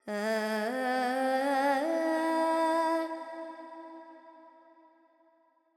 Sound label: Carnatic Female Alaps, Panthuvarali, 100 G, FX